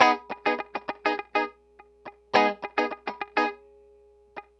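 104 Chill Pop Guitar Rhythm Ab minor, Chill Electric Guitar; Pop Rock; Pro Tools Essential Loops; Digidesign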